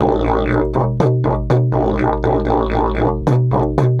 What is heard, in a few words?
120, Didgeridoo